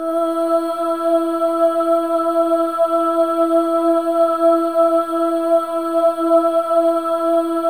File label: SOLO BOY, AH; Spectrasonics; Symphony of Voices